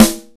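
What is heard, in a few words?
jazz snare, Ensoniq ZR-76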